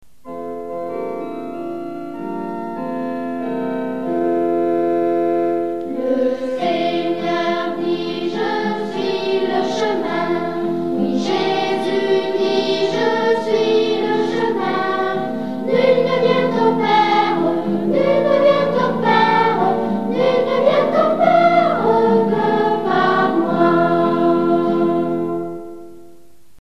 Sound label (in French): chant le seigneur dit je suis le chemin